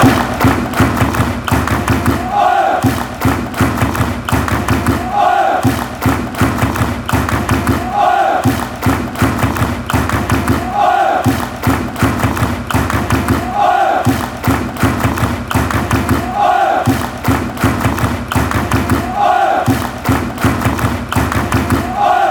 drums, chants; ajax

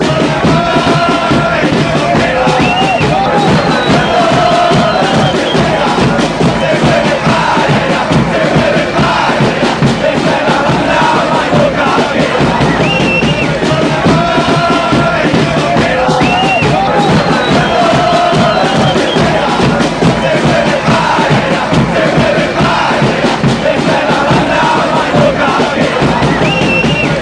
chant; houston

